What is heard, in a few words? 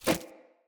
Grunt Foley Throw; Enemy; Characters; Corrupted Sproutfolk